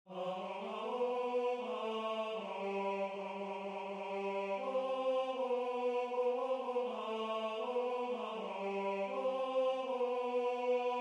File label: Tenor